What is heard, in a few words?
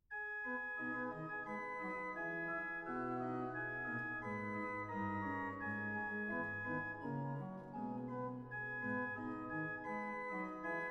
Avondzang, HW R8 O4 Tr8 RW H8 F4 N3 Trem; Var II; Vollenhove